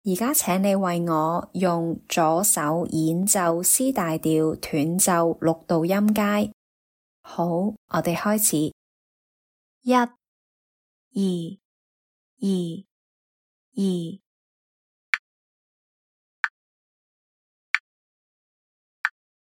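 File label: C major staccato scale in 6ths left hand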